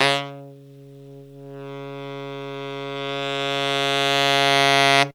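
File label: crescendo tenor sforzando; Zero-G; Phantom Horns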